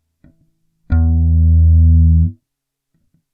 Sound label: plucked electric guitar string 12th fret